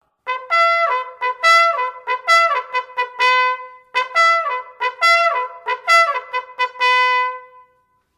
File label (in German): Feuerlaerm